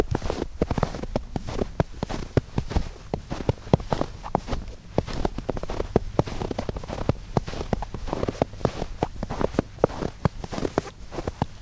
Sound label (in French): pas dans neige